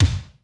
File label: DEF KICK, ACOUSTIC KIT; Greytsounds VOL-1